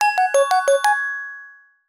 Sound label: app alert tone ringtone